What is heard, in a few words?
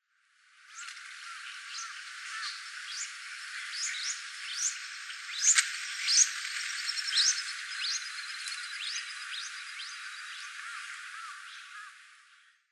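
Mandarin Duck; sano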